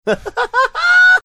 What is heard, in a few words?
cute laugh